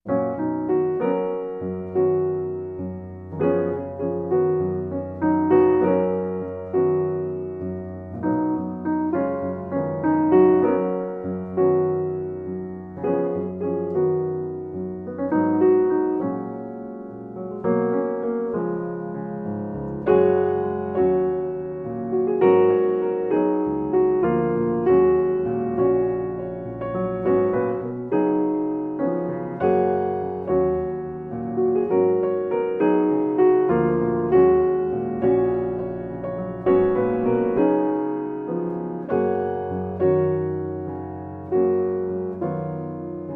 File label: Solo Piano